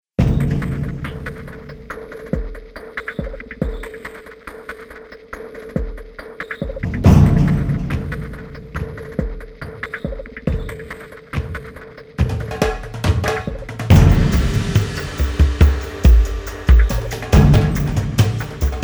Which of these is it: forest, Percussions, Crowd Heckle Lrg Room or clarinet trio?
Percussions